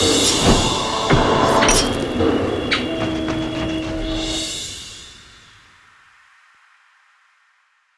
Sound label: Alert Sound Cheer